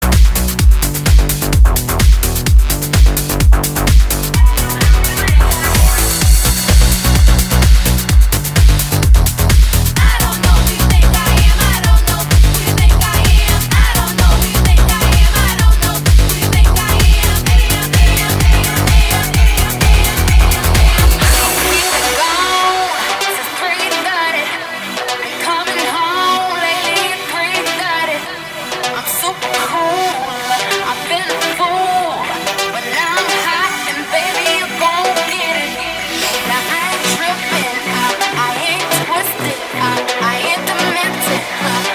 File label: ringtone, club mix